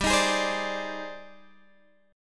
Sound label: Ab Major Sharp 11th G